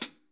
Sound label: FOOT; FUSION KIT; Northstar - New Gold VOL-1